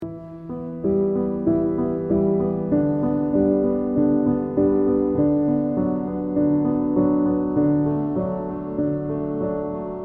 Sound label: relaxing piano; 10min; 4 beautiful soundtracks